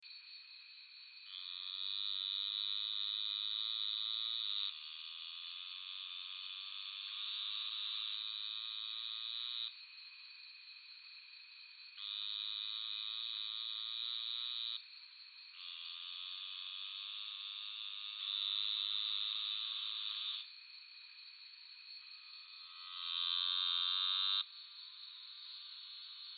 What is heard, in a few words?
Hypopachus ustus; microhylidae